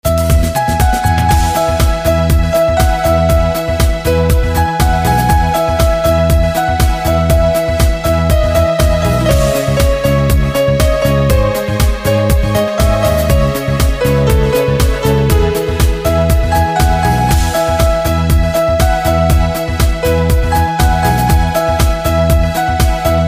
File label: Piano Instrumental Ringtone; punjabi instrumental ringtone